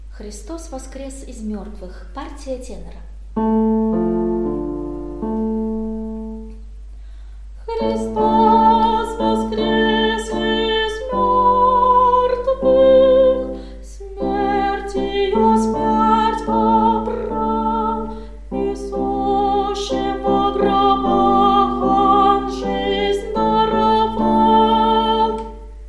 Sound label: tenor; Hristos Voskres